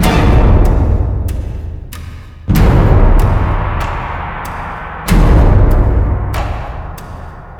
deathclaw search; slender

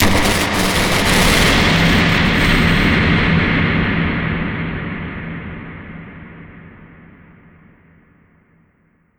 dramatic; slender; arrival

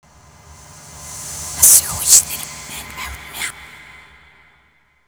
reversed; slender

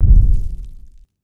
garg far step; slender

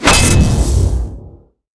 warp; slender

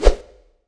swing; slender; cof

